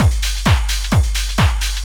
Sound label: techno, DRUMLOOPS